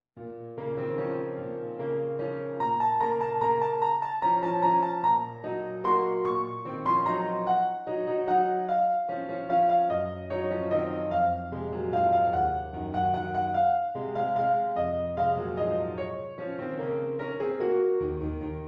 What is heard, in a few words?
piano Vignette